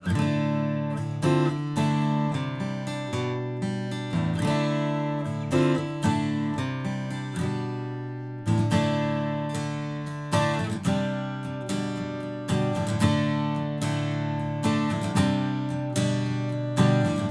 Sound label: D35 strum